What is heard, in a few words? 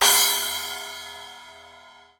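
Splash Hard, cymbal; hydrogen drum samples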